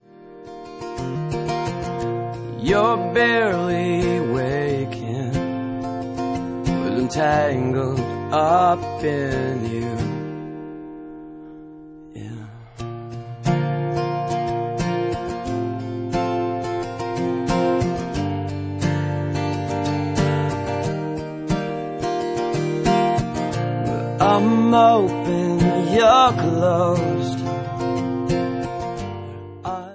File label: (Acoustic)